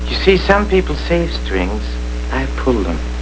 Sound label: strings; lorrelibrary